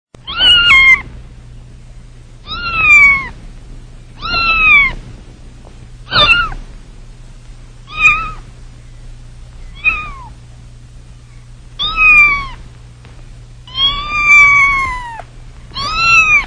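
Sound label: kitten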